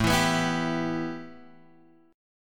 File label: A 7th Suspended 2nd, A, down, Guitar, Open G